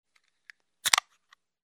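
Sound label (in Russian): открывание банки пива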